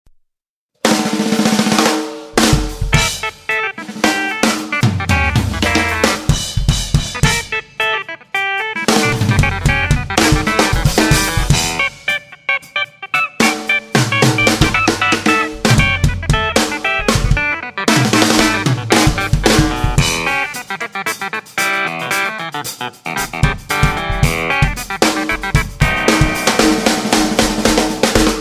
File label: clav